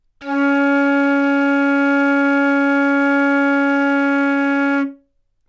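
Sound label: flute C#4 louder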